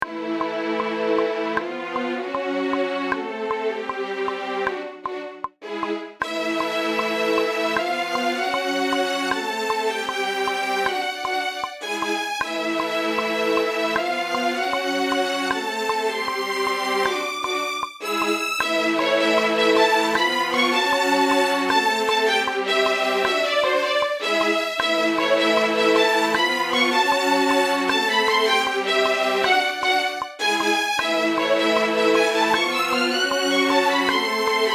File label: End Strings